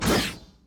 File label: Attack Light; bloom minigame